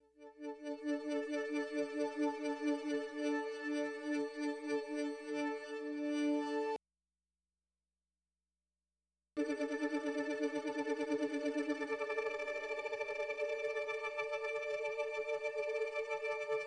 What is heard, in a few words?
Myo Massager